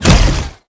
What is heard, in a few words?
Drone Missile Attack End; blue archive